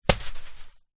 leisure juggling bean bag small land on carpet and roll; zapsplat